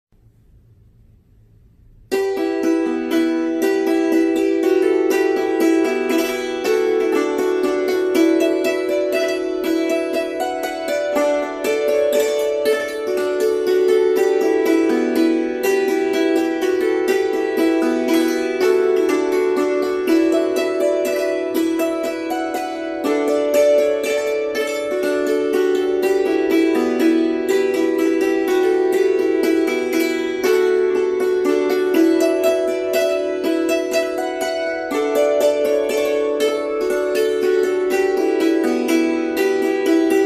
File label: on the hammered dulcimer; cam ye oer frae france